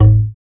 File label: LOGS, African Perc; Spectrasonics; Supreme Beats; African Contemporary; Afro DRMs